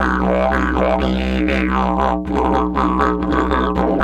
120, Didgeridoo

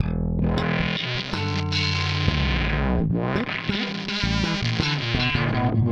Delay Distort, Bass; Effected; Bass X

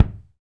BD, BD REAL

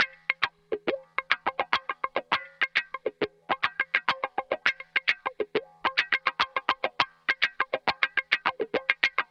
103 FUNK GTR WAH; 103 BPM FUNK B; Drumdrops; Compact Disco

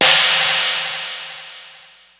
China Crash, Ensoniq ASR-X Pro

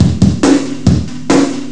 drum sample; Amiga Soundtracker Sample Packs

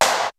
XR-10 K+SN+H, drums; Rubber Chicken Software Sample Bank; DRUMS + PERC

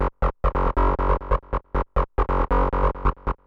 ALARM BASS, Techno 138 BPM; Best Service ProSamples vol.54; AKAI; FALLING HI

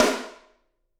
SD, Drums; Bob Clearmountain Drums I

